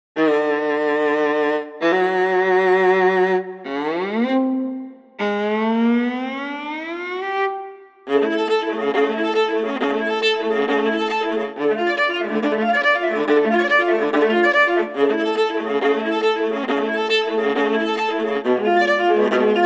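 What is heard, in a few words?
VLA solo, viola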